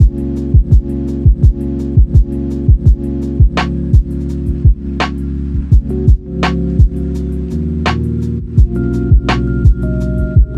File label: lofi hip hop